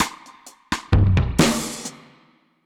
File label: Drums Wet 130, dub drums samples, 130bpm; musicradar